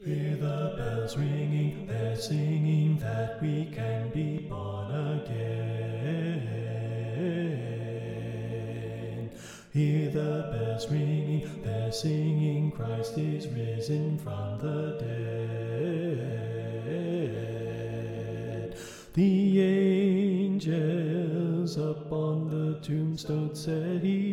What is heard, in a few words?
Bass Left